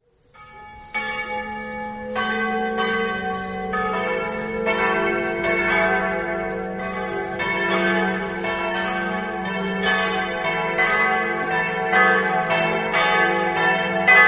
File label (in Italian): campane; ipplis